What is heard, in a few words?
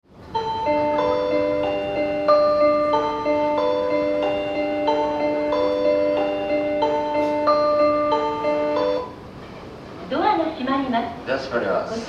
melody, keihintohoku